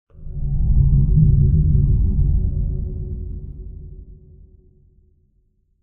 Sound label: whoomp, synth; video game; ambient; source engine; portal2